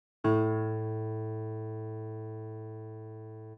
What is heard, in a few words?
A3 piano